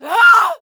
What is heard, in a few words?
Female 36yo Scream; Infernal Radiation